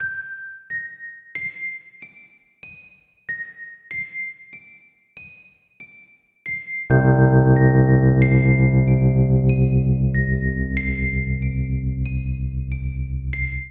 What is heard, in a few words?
Ostinato Cinematic Tense C Minor 70 bpm, Electric Keyboards; MIDI Clips; Ableton; Factory Packs